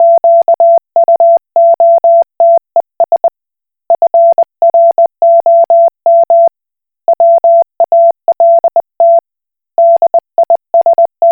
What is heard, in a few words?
Koch Morse